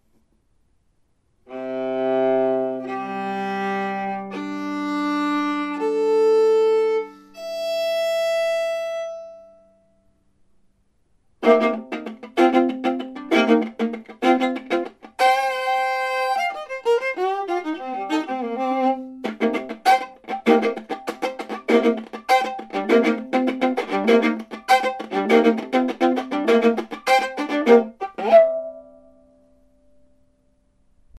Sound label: Violaquinton